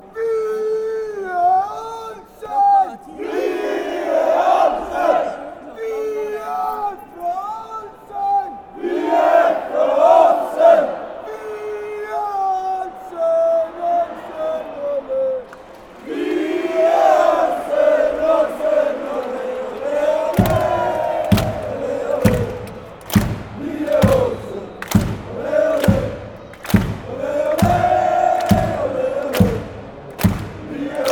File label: aalesunds chant, fanchants